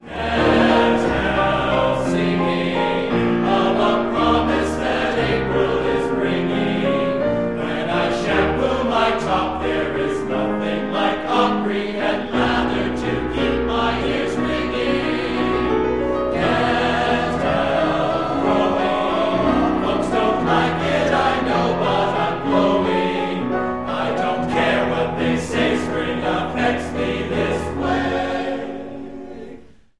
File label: singing